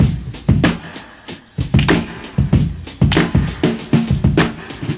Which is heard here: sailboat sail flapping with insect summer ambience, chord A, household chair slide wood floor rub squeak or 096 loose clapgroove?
096 loose clapgroove